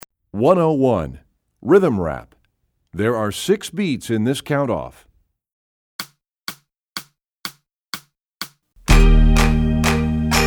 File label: Rhythm Rap, Percussion; EE2000 Book2